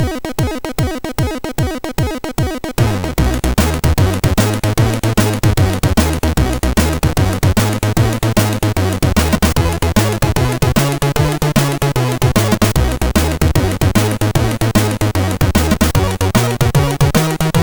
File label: Boogy Woogy sid MOS6581R4